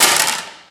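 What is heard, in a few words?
physics clipboard impact medium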